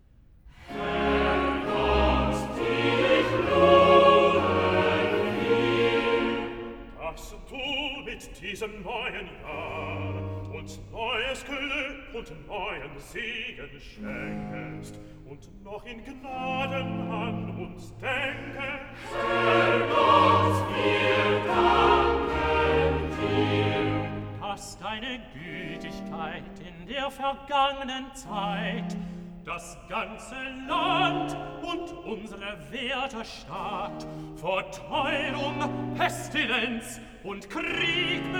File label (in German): Choral e Recitativo (Bass Tenor Alt); vocal; alto; BWV190; Singet dem Herrn ein neues Lied!; Herr Gott dich lobe; BACH